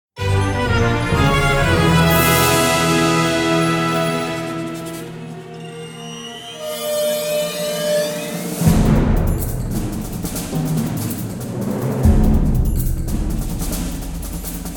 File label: Snowboarding